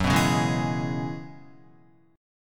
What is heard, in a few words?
F sharp 7th Suspended 2nd Suspended 4th, Guitar; Listen to F#7sus2sus4 strummed